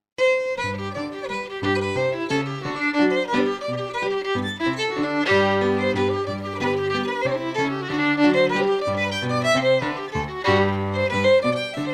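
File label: Reel, Scottish Fiddling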